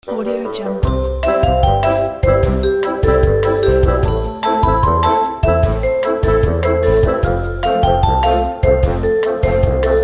animation and games character forest path

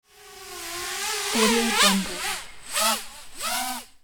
little drone flyby; kudlaproduction

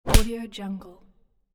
punch

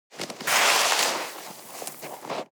foley snow grab; smartsoundfx

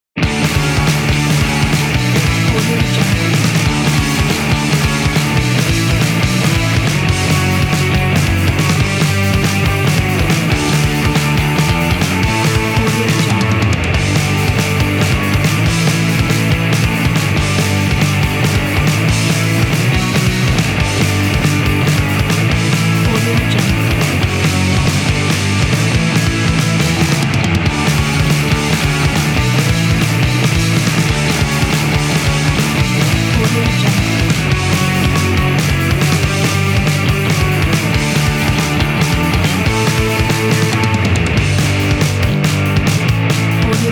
catchy punk jam

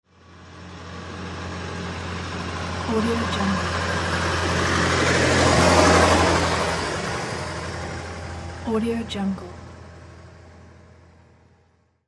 diesel tractor driving by

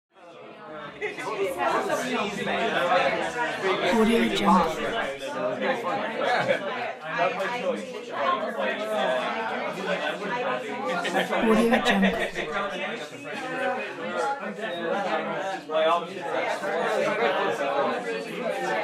crowd talking; pure-noise

